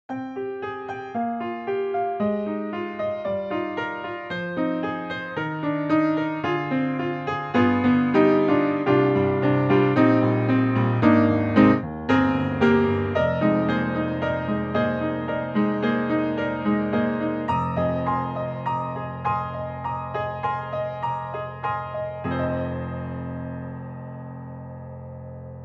Keys Modern Upright Ambient; XLN Audio; Addictive Keys

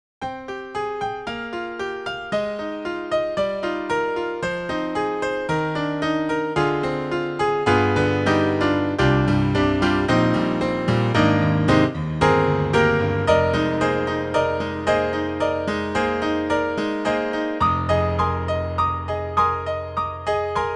Legacy Grand; IK Multimedia; Sonik Synth 2

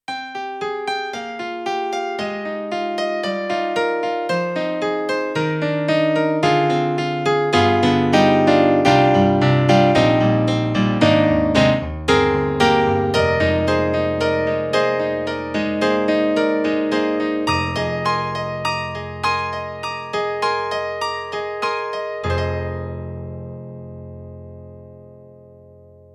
Korg Triton-Rack Bright Piano

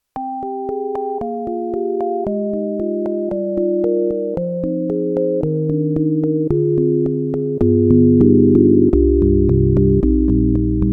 Yamaha MU1000EX Sine EP

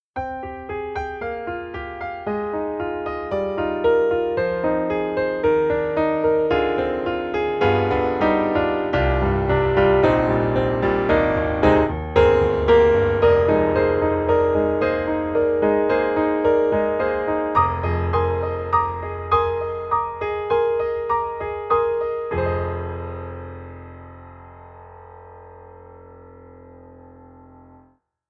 American Grand Close Mic; VI Labs; True Keys

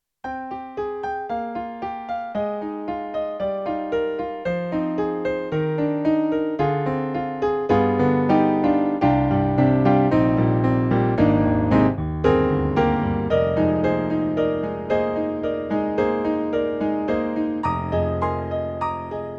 Dark Grand; YAMAHA MOTIF-RACK ES

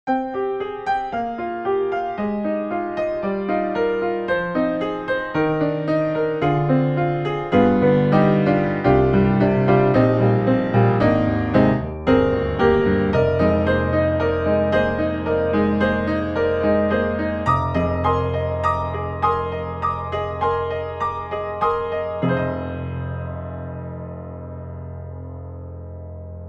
Virtual Grand Piano Funk Piano; Art Vista; Virtual Grand Piano 3

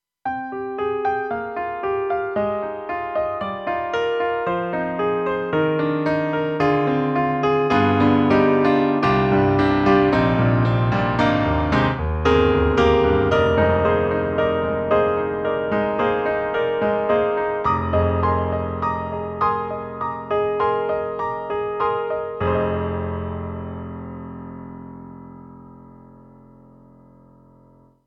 KORG M3 XPanded M3 Grand Piano

